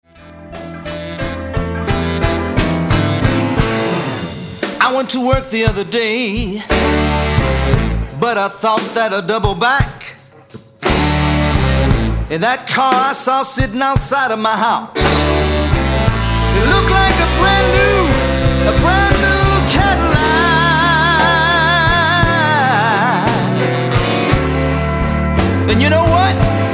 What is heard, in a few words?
blues